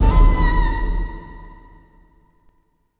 Air Attacked; Civ4 warlords

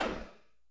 L-39 roll trimer end, aircraft; DCS

